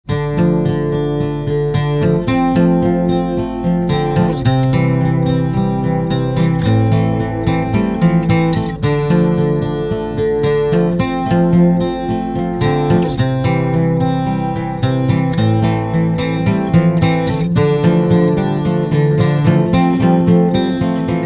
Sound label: acoustic part